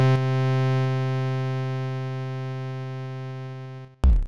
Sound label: synth, amiga med